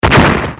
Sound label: Bomb